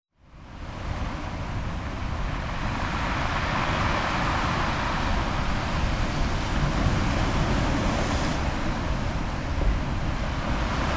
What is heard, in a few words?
waves breaking